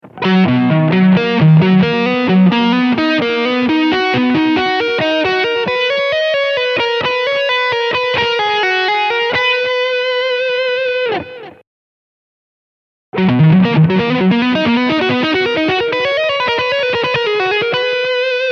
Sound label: SImaj7